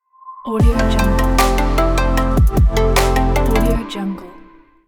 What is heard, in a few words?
melodic dubstep logo